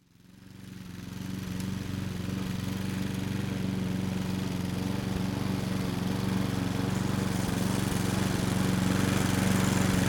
lawn mower

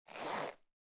jeans zipper, cloth

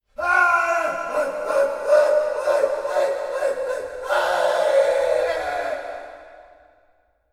man screaming; human

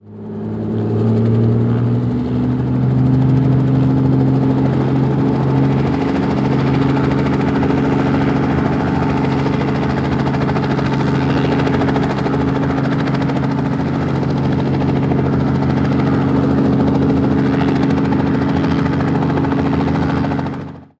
helicopter starting exterior; transportation

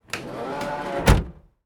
van side door slide close exterior perspective; transportation

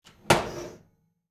oven open, kitchen

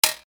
button

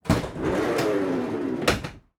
van side door slide open interior perspective; transportation

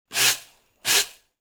broom sweeps; cleaning

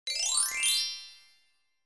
magic chime

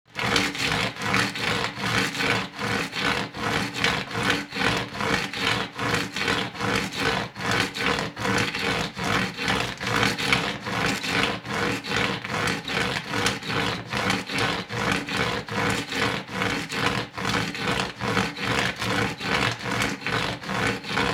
rubbing; mechanical

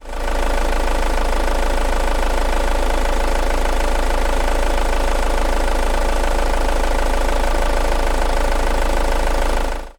van idling; transportation

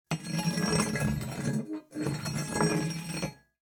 fireplace cleaning

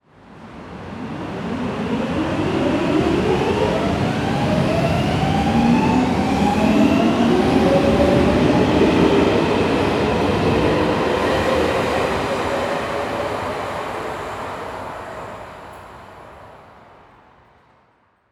metro departing exterior; transportation

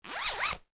jacket zipper, cloth

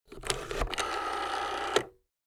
rotary phone

